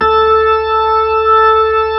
B-3, Keyboards

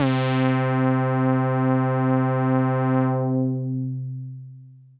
Synth C, Synth Prophet One Shots; Electronisounds

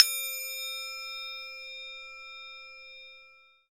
percussion bells

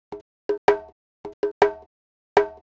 Conga, Latin Percussion

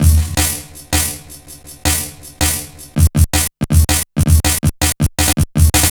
Stretcher 162, Drum Loops, Jungle; Jungle Warfare 1&2

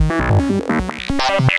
150 Cm, Melodic Loops; M8; Electronisounds

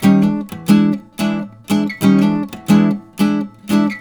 RIFFS, Blues Guitar; ILIO; Fretworks

